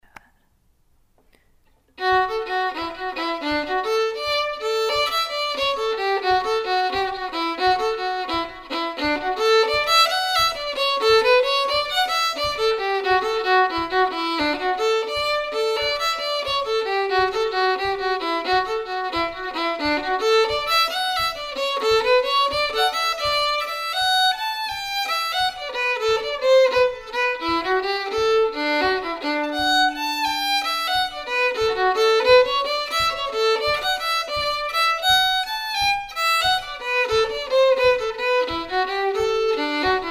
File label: Jig, fiddle; Paddy Taylor's Jig